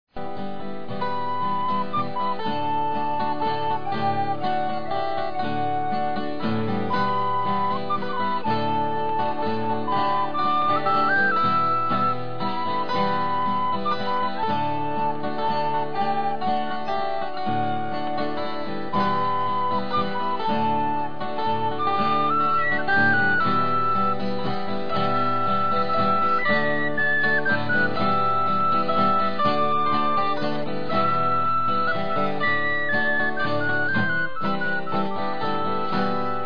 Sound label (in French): valse